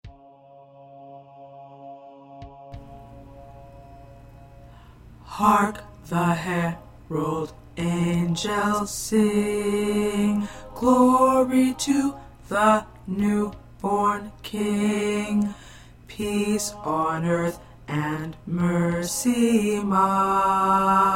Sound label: Tenor; Hark The Herald Angels Sing by Joubert